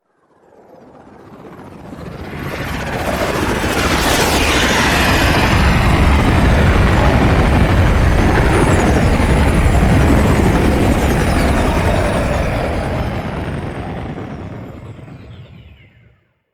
Blue Sky Liset Relay Docking, vehicles; Warframe; Liset Flight

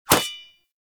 Rising Blades Sword Impact; Warframe; Pax Duviricus

